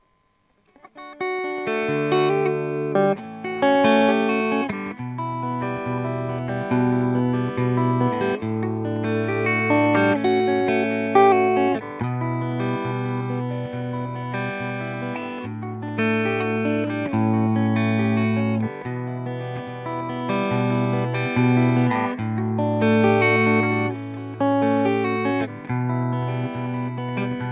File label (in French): clean G1 SP micro manche P90